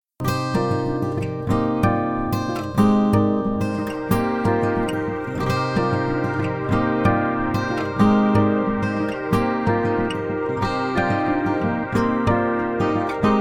bonus playback

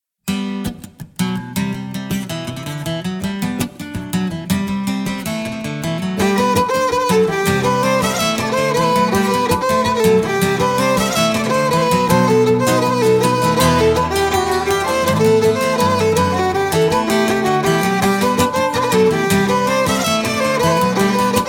suite plinn ton simple

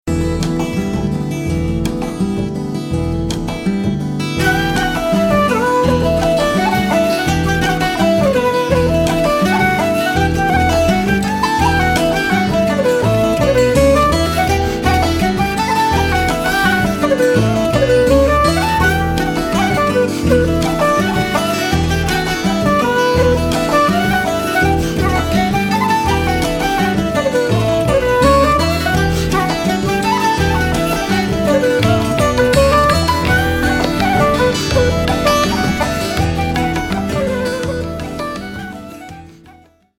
ton doubl plinn

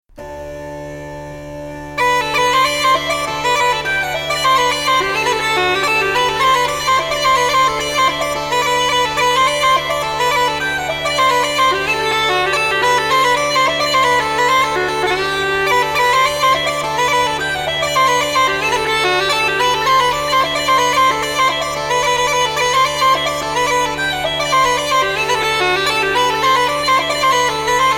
xota danse galicienne

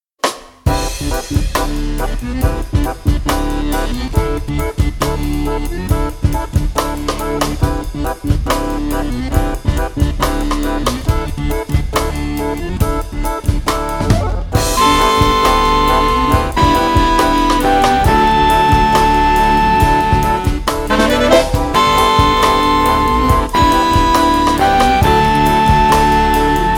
maraichine; hopopup

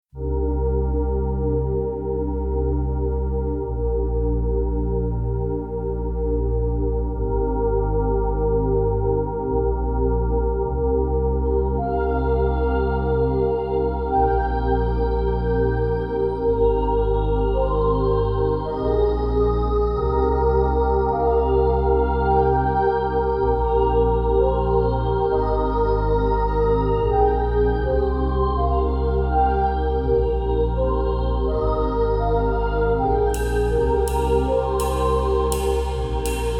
bonus playback

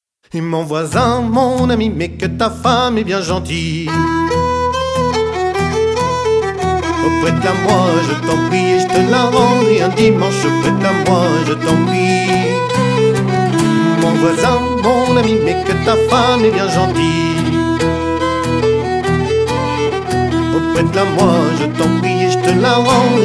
branle de noirmoutier

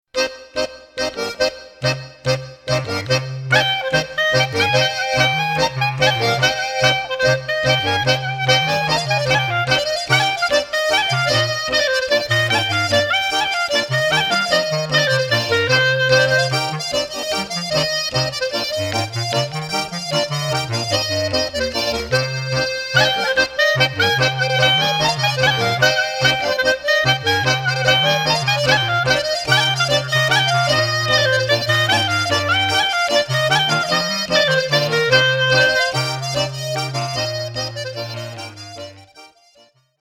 dans plinn ton doubl; musiques a danser de haute bretagne

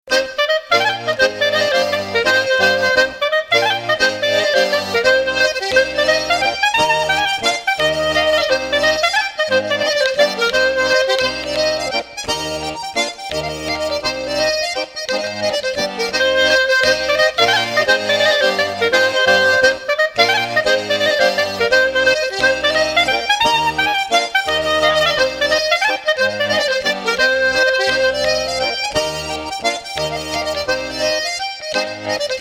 gavotte des montagnes; ton doubl

